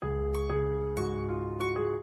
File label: Vivo Y27 5G Notification Ringtone